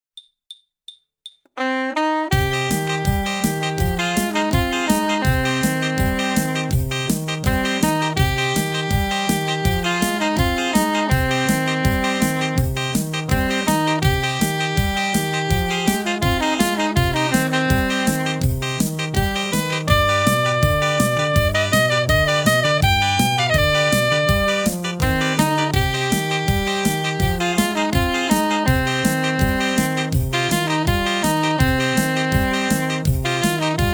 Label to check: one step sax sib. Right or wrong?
Right